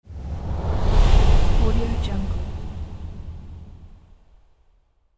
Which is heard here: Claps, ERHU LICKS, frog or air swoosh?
air swoosh